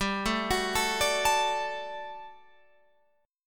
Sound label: G Major 7th Suspended 2nd G down Guitar Open F; GM7sus2; arpeggiated